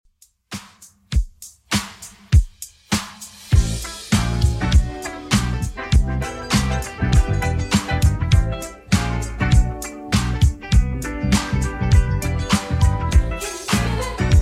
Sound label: 80s Redrum 100bpm CLEAN